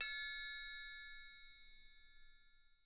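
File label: triangle; Voice Crystal - Steve Reid Definitive Percussion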